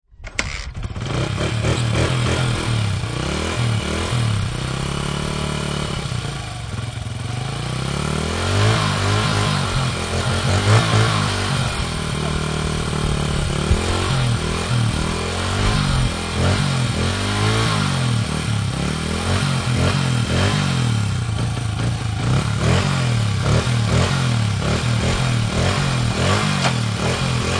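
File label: Start Rev Drive Dirt Bike, Start and rev dirt bike.; Motorcycles; Vehicles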